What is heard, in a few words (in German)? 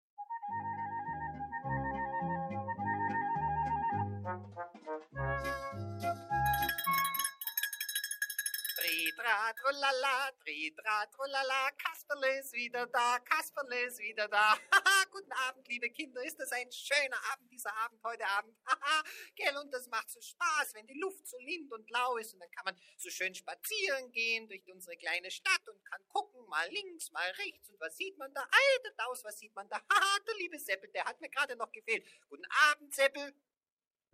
Alte Schallplatte bearbeitet